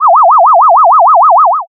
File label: ufo